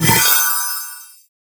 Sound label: Sealed Cache Key Pickup; Warframe; Zariman